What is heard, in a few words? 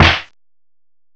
SNARE, DRUMS